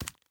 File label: Footstep Run; Devour